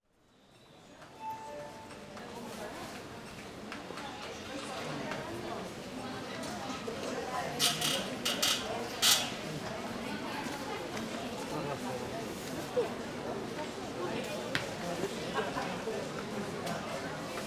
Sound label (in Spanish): AMB INT Hall entrada Sanatorio gente murmullo timbres llamadores; Casmu